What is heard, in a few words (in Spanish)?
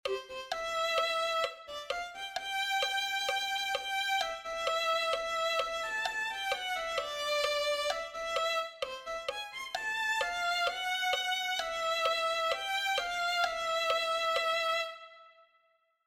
reinara el reinara violin